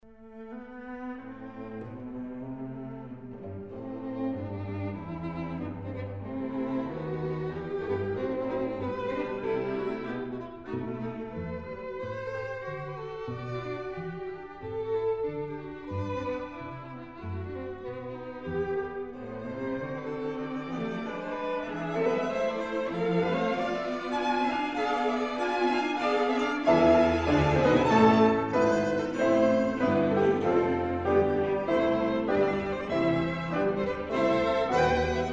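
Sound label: piano quintet; kiel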